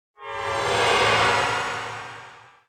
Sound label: effect ghost; feheroes